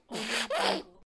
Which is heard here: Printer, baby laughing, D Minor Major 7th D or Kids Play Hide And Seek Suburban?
baby laughing